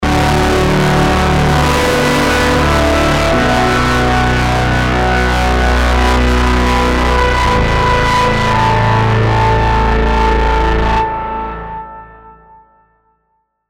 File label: Pad Distortion